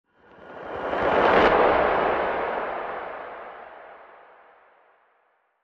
Crackle Whoosh

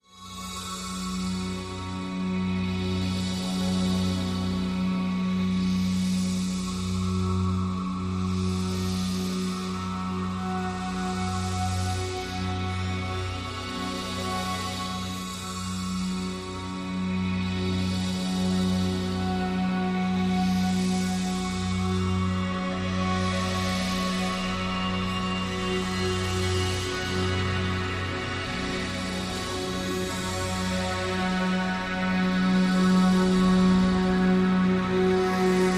Radius Drone

